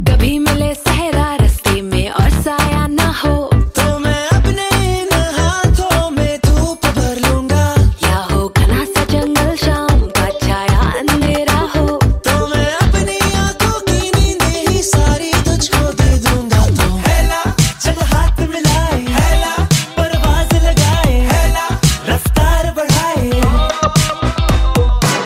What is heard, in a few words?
new hindi ringtone, bollywood ringtones